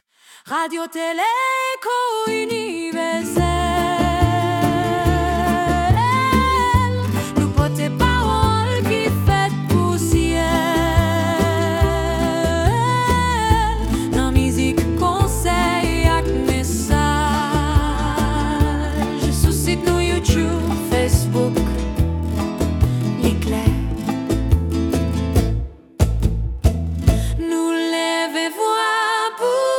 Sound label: rain and natural soft melody track